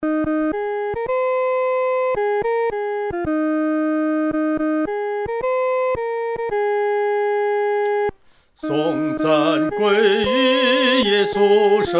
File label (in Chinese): 独唱 第一声; 颂赞主圣名